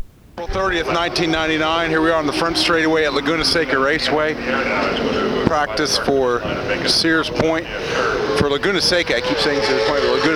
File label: motorcycle sounds, ama superbike